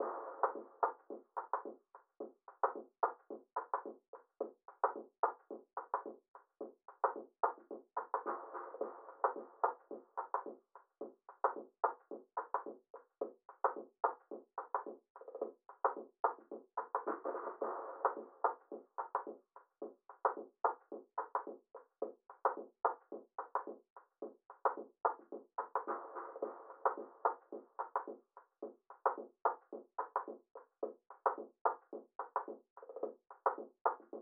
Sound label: 102 BPM G Min Percussion, Afrobeats; Melody; Loop Stems; Cymatics; Know You